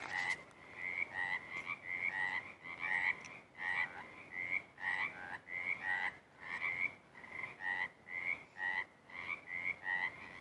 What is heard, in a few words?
frogs; Ambience